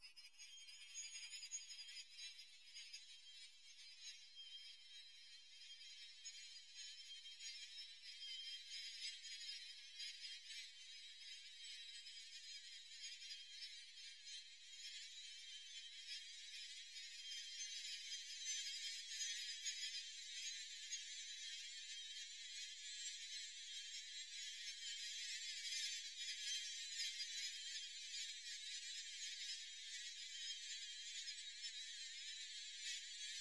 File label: Bird, Garden